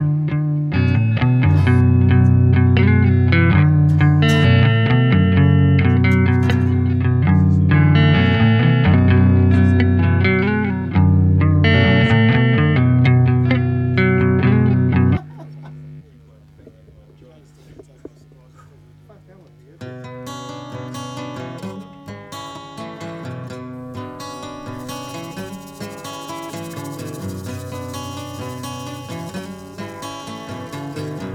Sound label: warping mix